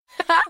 upgrade action; Becca

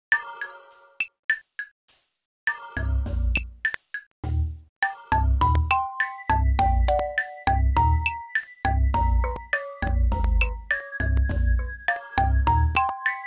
Caves; SoulFu 1.5.6

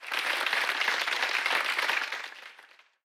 Karaoke Applause; nari kids park ultraman r b switch gamerip 2018

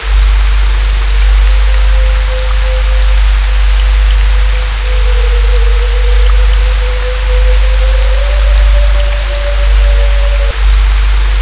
Haunted Rain; luigis mansion dark moon; 3ds switch

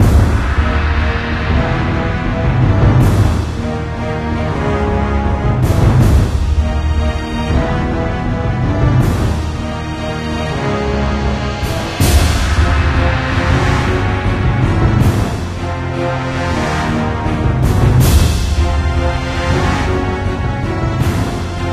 Intensive Battle Loop

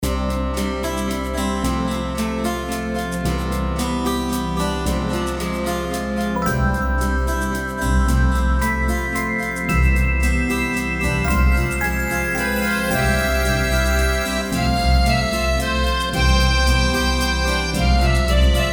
bgm farm; Kardia Fantasy Mamono Hime-tachi to Bouken Monogatari; android

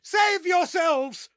Flee; We Happy Few

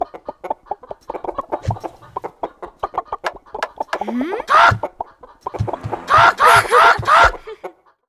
INTRO OUTDOOR; Playmobil Circus; wii

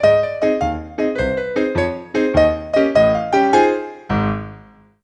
Parl Music

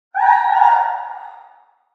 Dog Injured Distant

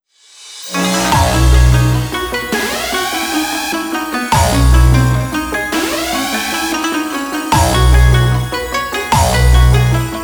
Hardstyle; Salty Noise Redemption

